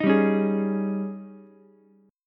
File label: D sharp 11th D sharp, Ukulele; strummed; 8,7,9,11; JGuitar